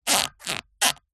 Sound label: rubber squeaks; zapsplat